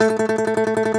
DOMRA, Plucked Strings, Segundo Domra; E-MU Producer Series Vol. 7 – Old World Instruments

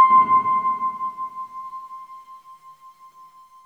HARMONIC C5

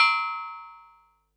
Perc, Percussion and FX; Pearl SC-40

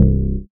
DM BAS, Dance Mega Synth

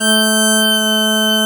BP BASS G3, Celtic; USB Soundscan vol.42 - Celtic Flavours; AKAI

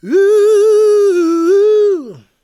GOSP MALE, SOLO; Vocal Planet Vol1 Gospel

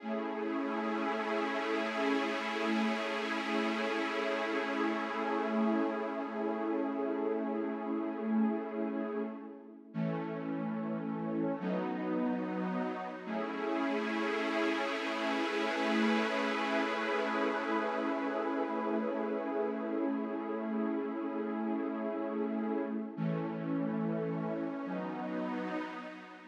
swell pad, Smooth RnB, F#min

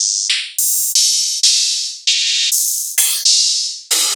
Hats Open

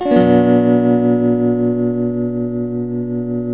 TELTMUMIN C, TELE CHORDS, Guitars; Spectrasonics; Hans Zimmer Guitars VOL-1

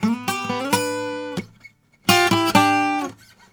Blues Guitar; ILIO; Fretworks; LICK

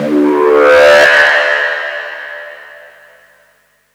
Terrain Howl; M8

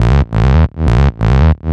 bass licks, techno, 138, c#; instrument-loops

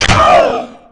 PTUUHH, VOICE; Rarefaction - Poke in The Ear With A Sharp Stick

